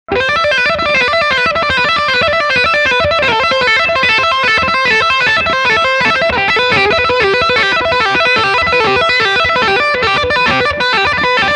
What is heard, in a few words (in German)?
Lick Steg HB